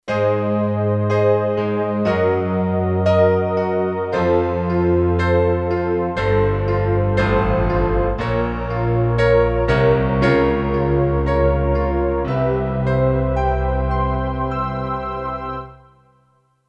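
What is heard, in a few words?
Stereo Piano & Orchestra Pad, Kurzweil K2500X; Factory Programs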